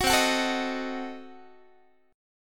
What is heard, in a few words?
C Diminished 7th down Guitar; strummed; JGuitar